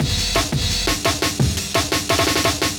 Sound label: fine fill; breaks; m8 breaks